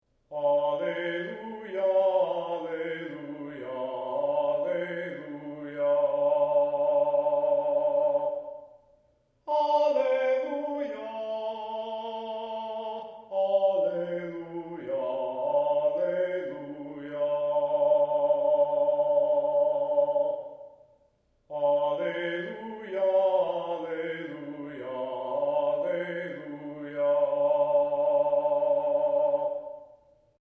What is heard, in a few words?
Syllabic Chant